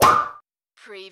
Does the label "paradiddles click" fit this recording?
No